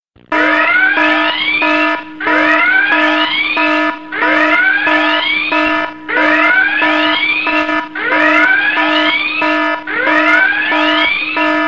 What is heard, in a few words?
Red Alert